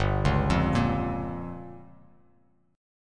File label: G Minor 7th Flat 5th; Gm7b5 arpeggiated; JGuitar